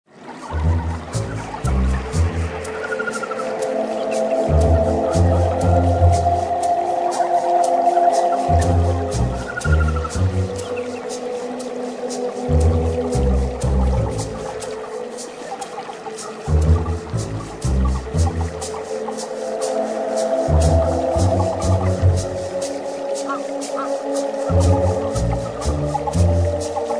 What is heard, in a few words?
Voodoo Swamp